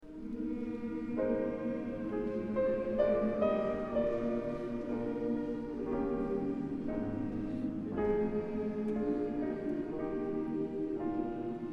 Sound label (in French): hymn a la nuit